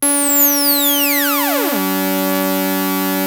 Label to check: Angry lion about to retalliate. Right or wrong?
Wrong